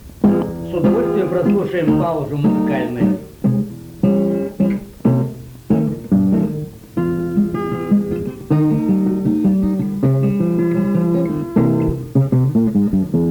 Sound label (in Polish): Gitara